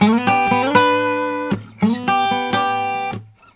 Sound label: Blues Guitar; ILIO; Fretworks; LICK